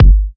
Kicks Electro

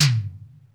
Toms, Electro

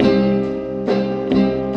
guitar

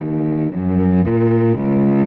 bass, Loops

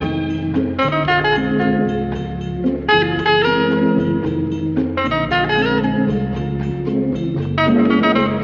sax, phonky loops

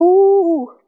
"ooh" sung; ooh ahh

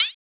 elektro, Misc Percussion Ticks

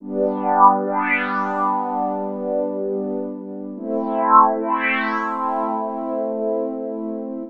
arcade time, Electronic Loops

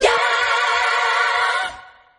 yeah SUNG

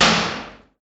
Snares, Real

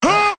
huh, Voice; ooh ahh

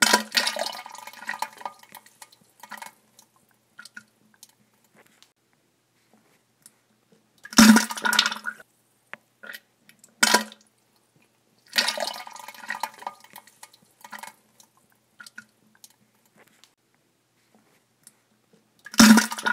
shitting

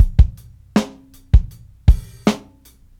Drum loops, Boombap

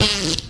fart shortish squeaky

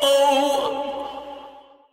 "oh" sung

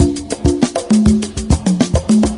african rhytms, Drum loops, Funky

